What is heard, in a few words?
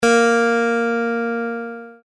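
B flat, guitar, E flat tuning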